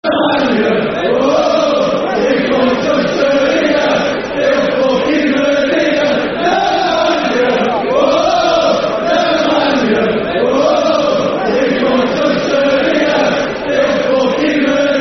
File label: Chant Football Chant (Soccer Song); Nemanja Vidic Chant; Man United